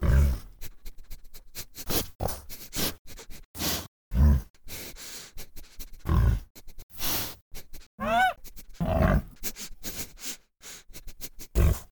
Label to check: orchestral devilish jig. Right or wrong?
Wrong